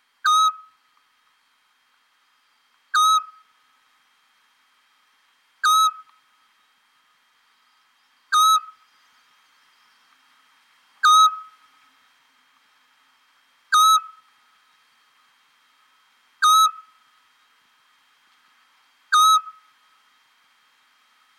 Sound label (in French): hibou petit duc otus scops